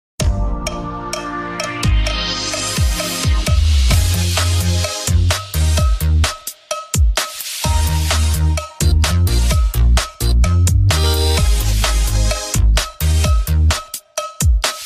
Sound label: Llama Bell